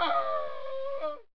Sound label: die; dopewars